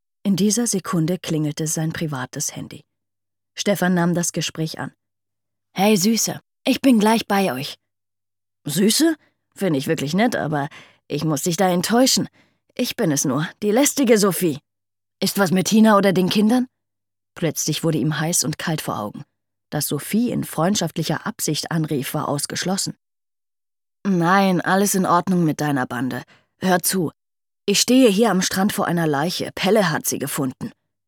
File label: music